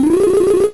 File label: Magic Blast, Sound effect; SMA2